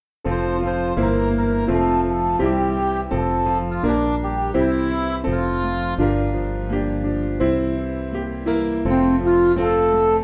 Midi; Whosoever Anywhere; Open Door

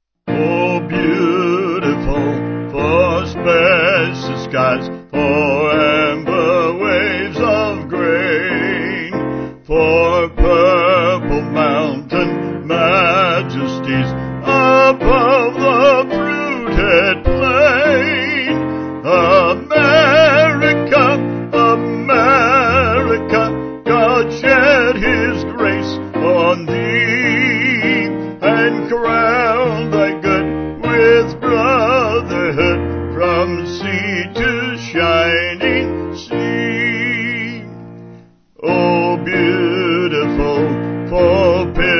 Vocal Piano

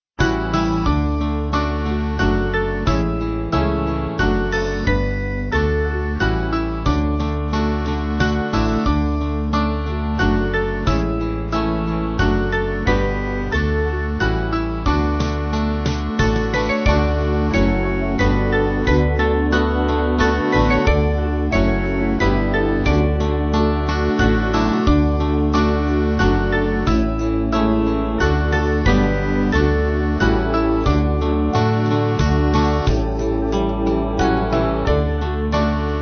Nettleton Band; Come Thou Fount

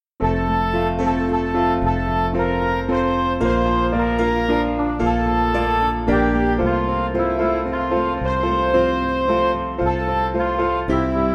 Oh What A Wonderful, Midi; Macomber

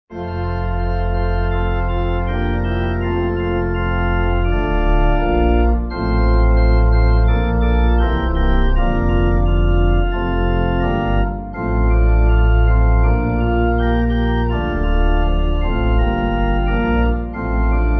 pipe

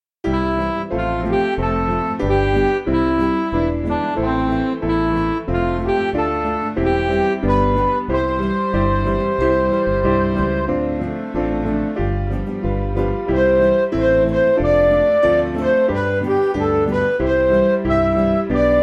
Come Let Us Join, Cheerful, Midi

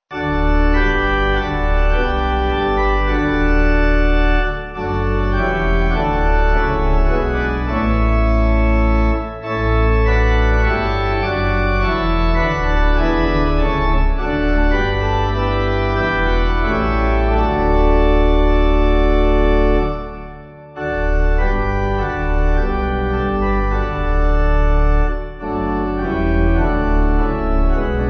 We Give Thee, Pipe Organ

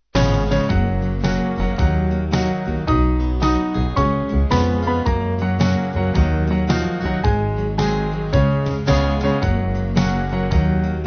band

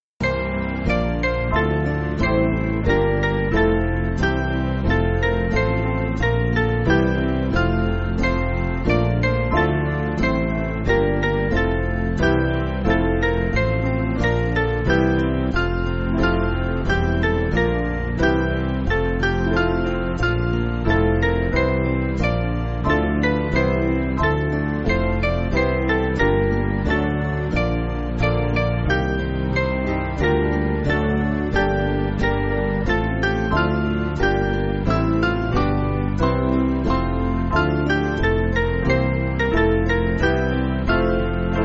Band, Backing Files for Churches; A Lamb Goes; An Wasserflussen

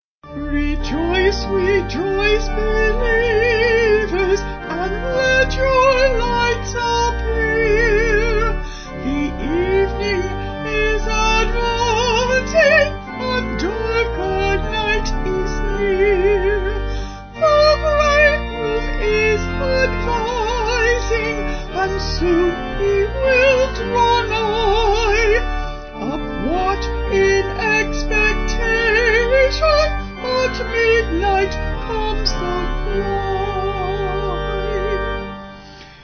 Rejoice Rejoice Llangloffan Vocal Organ; Vocals and Organ; Sung Lyrics

